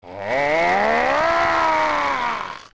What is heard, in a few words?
Ganondorf Energy Charge